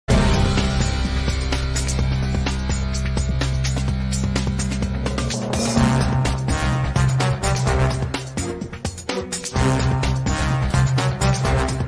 anime opening ringtone; trafalgar d law; one piece

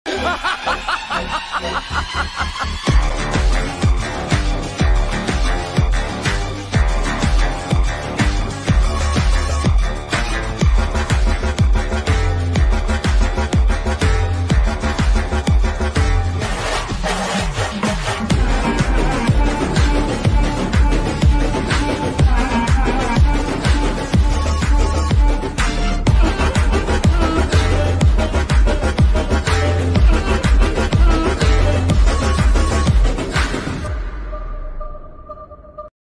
Bgm Mix Ringtone; Cristiano Ronaldo; Animal

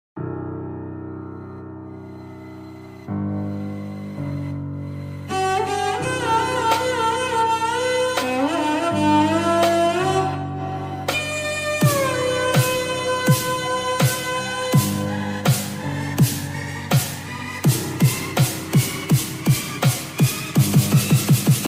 bgm slowed reverb; vikram; bgmi; pubg montage

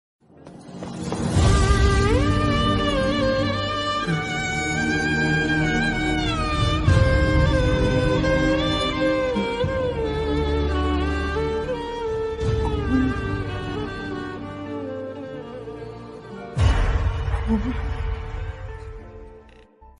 sad bgm; iddarammayilatho; allu arjun; amala pal

